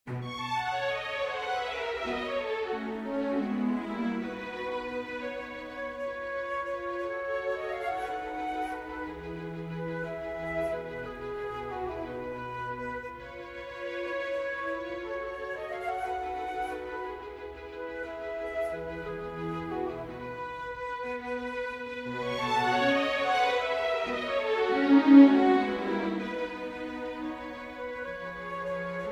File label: for flute strings; Schumann, Robert; mondnacht